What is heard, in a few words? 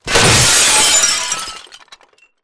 glass break close; corporate; Titanfall 2